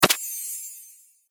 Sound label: Archery Magic Shot, Bow And Arrow Sound Effects